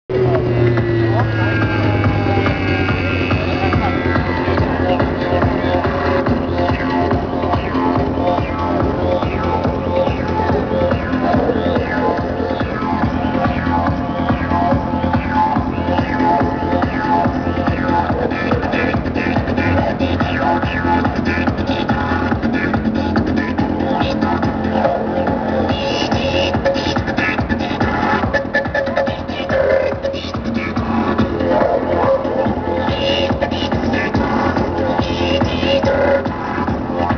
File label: didgeridoo